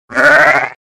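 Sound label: sound of ram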